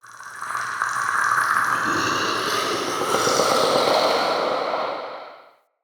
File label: Male Vocal Idle, Enemy; Characters; Mockeries